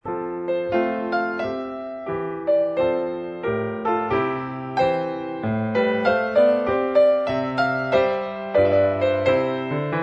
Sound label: Handel Air Piano